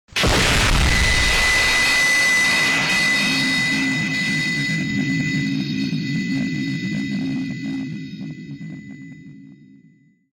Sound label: flashbang